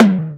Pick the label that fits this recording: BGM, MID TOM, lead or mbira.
MID TOM